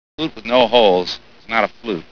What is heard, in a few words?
flute; A Flute with no holes; Caddyshack